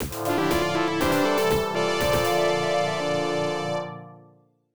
Field Battle Victory; ibm pc at; Xuanyuan Jian Waizhuan Feng Zhi Wu

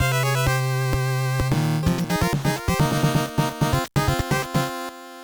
Stereo Game Over; final mission 1990 nes gamerip

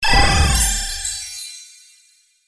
Level Up SFX; Guardians of Hyelore; Windows

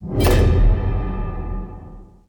grapple sting in

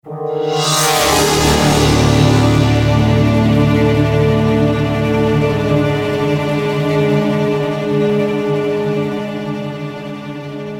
Elevator Scare; ratchet clank into the nexus

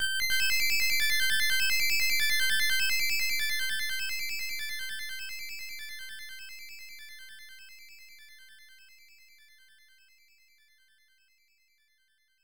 Level Clear; Kirby's Adventure

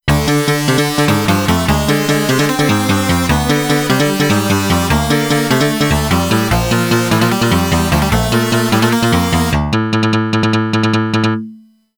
The crying strings; Yuzo Koshiro Early Collection Box

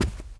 run carpet; Lucius Demake

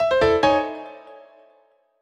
Jingle Waterhazard; Clubhouse Games: 51 Worldwide Classics

